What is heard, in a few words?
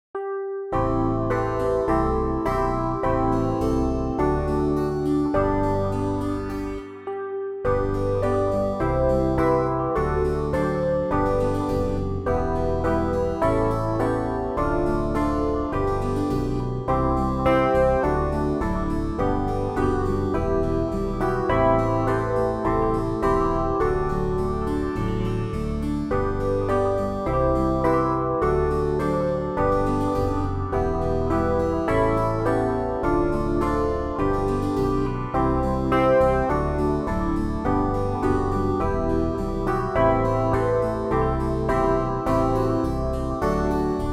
backing; New Hope Music